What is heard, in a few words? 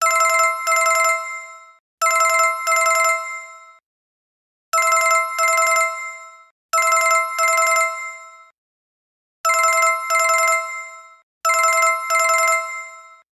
Normal Ring